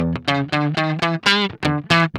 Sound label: GUI, licks; 120 LICKS